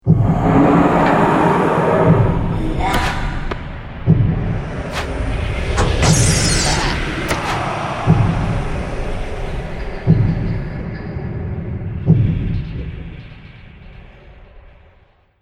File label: Fantasy Battle Scene